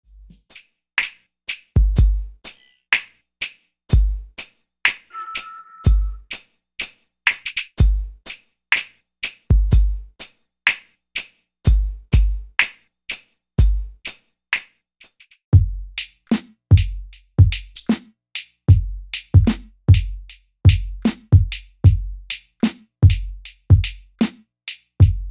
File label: beats